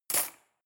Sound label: cutlery cake fork drawer place hard